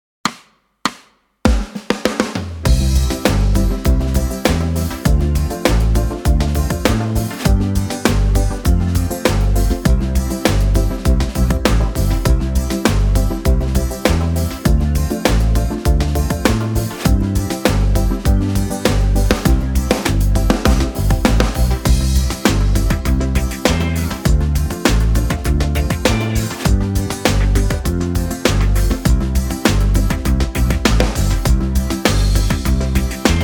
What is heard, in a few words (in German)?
marsch play-along; schlossberg